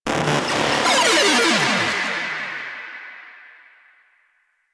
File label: Arcade Fail